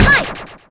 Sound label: high kick toe hit; HeavenStudio; space Soccer